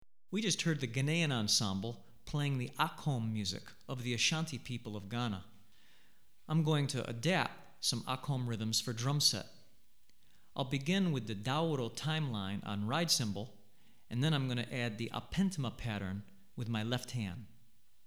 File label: Akom, Drumset Example, West African Rhythms For Drumset; Hartigan